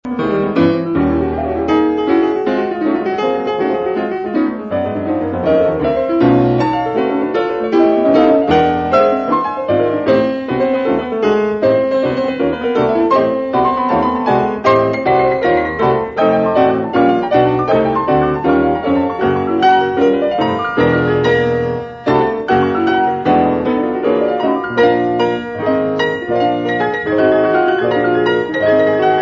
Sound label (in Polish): Tarantella na 2 fortepiany